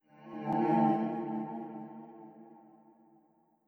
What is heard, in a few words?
Soulnoise